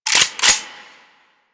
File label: Heavy Weapon C Chamber; Warframe; Heavy Weapons